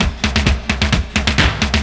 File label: drum 130, techno trance drumloops